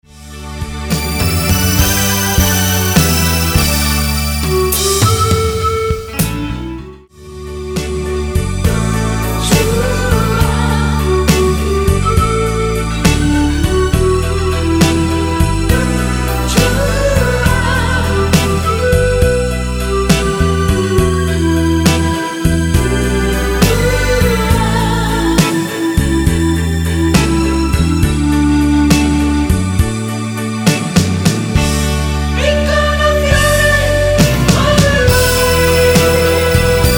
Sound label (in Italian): Terzinato Canto